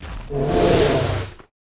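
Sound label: Ice Bear buff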